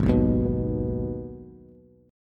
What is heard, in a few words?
Ab Minor Ab down Bass; Abm strummed; JGuitar